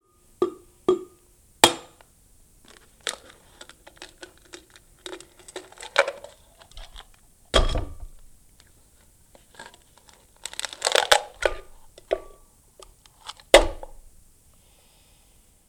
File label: Egg tap and crack into cup twice; Tapping; Objects; Tom Hutchings